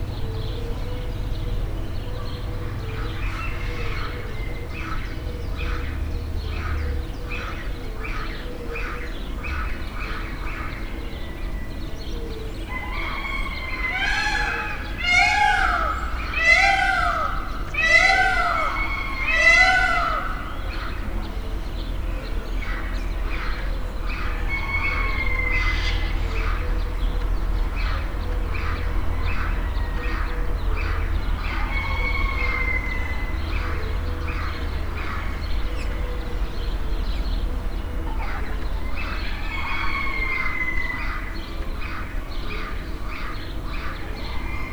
páva és lábasjószágok oroszlánkifutó mellől; állathangok; nyíregyházi zoo 2010

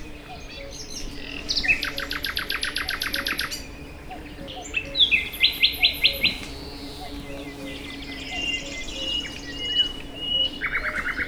hét óra után jobbra neszezés, madarak, Hortobágy vasútállomás; allathangok; termeszetben

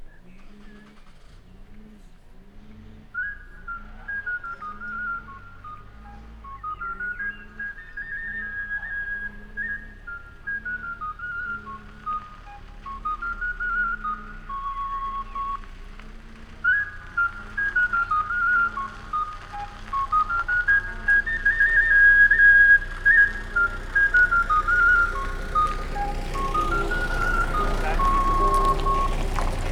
zöldséges autó, fűnyíró, zenélő autó; Szögliget

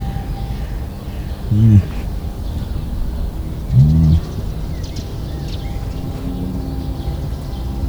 közelről dünnyög, oroszlán; marantz puska; debreceni zoo 2012

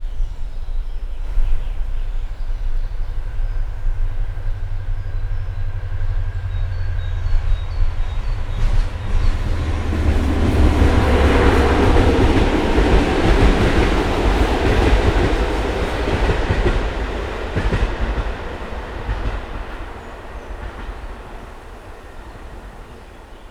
nagy vonat, vonat kivulrol; belavar felol; vizhaz; cardioid

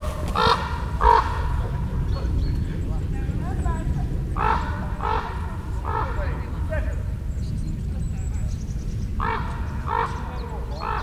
elrepülő holló; termeszetben

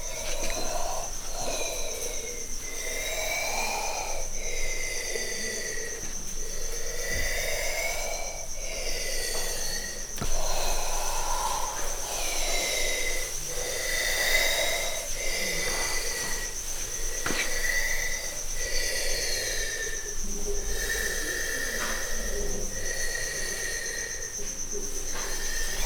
védekező támadó fújtatás, keselyűteknős; jaszberenyi zoo 2016

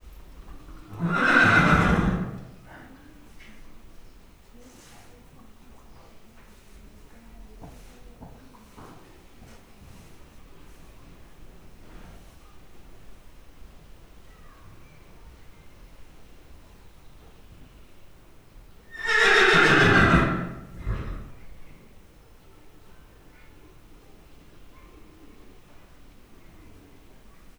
két nyerítés, ló; miskolci zoo 2009

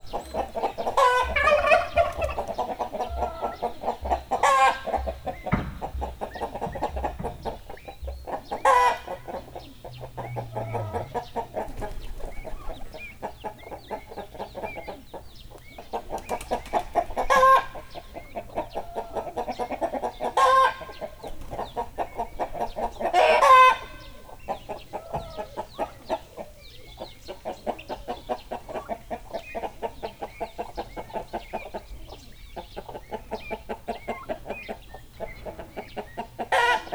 baromfiudvar tyuk pulyka galamb emu nandu; állathangok; miskolci zoo 2009; paraszt udvar

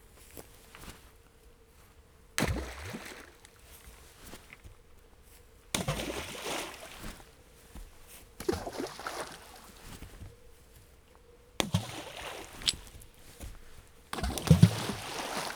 jobbra dobott kovek, vizbe dobalas; vizvar; cardioid

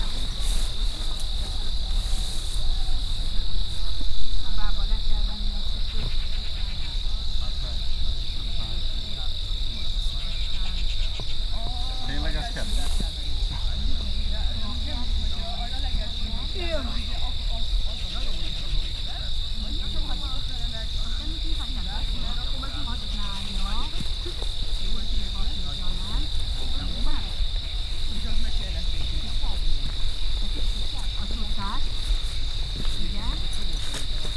szurkulet utan vonulo csapat nemzeti park

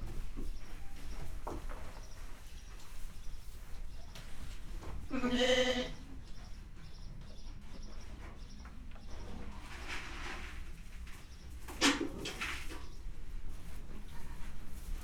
hodály, pusztai szél, házi kecske; Hortobágyi Nemzeti Park 2013

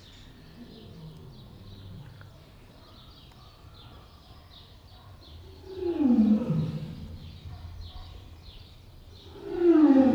oroszlánházban bömbölnek; marantz puska; debreceni zoo 2012

